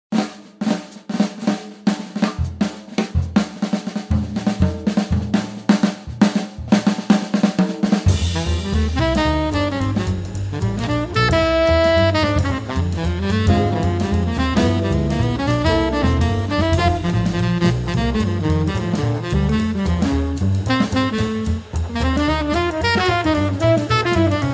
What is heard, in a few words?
Double Guitar Quintet